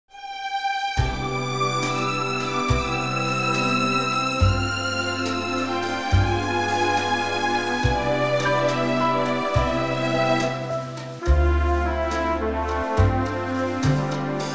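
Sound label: orchestra C; key - C - vocal range - B to E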